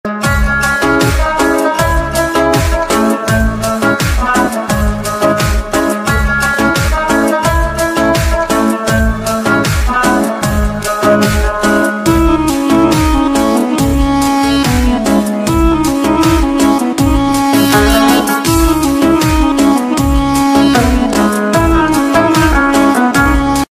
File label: kashmiri ringtone romantic ringtone song ringtone